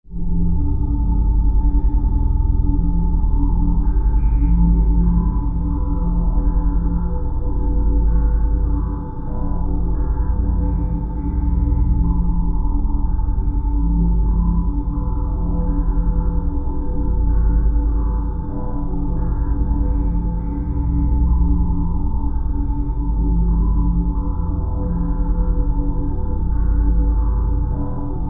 sound design horror drone atmosphere

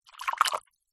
office paint brush artists wash in cup of water

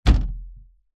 household wardrobe door close freestanding wooden

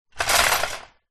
shopping cart metal push on concrete ground short; foley; zapsplat

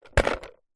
sport ping pong balls in plastic tub set down; zapsplat

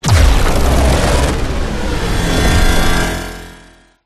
EXTREME IMPACT; PMSFX

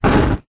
nature fire flame air wind blow blast fast short

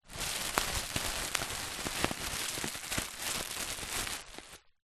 plastic bag stuffed with paper handling

